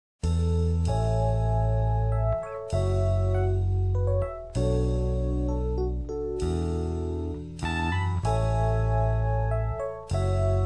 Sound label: steel pan sax